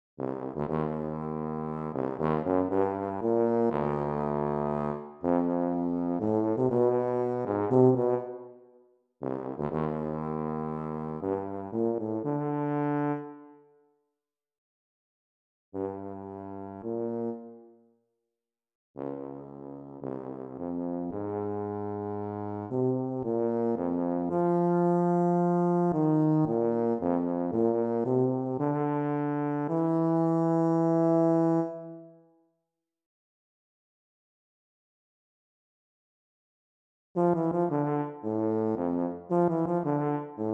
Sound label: Serenade for Solo Tuba